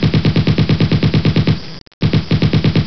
gun machinegun 2 bursts

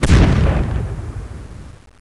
gunshot big

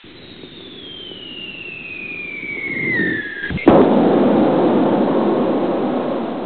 explosion bomb drop

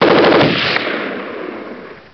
gun machinegun burst with echo

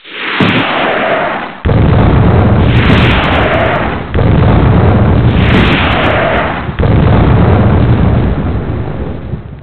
explosion missiles fired three with explosions

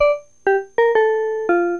instrumental pop Goes The Weasel

